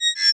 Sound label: Science Fiction Sci-Fi Electronic Alert Beep High